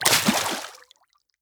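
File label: water run, footstep; fst water; skyrim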